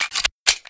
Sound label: clip in, weapons, deagle; garrysmod